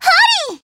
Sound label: attack, jp